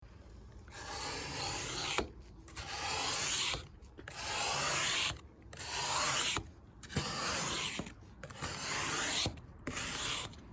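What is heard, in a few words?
skrebok po bokovym steklam, scraper on side windows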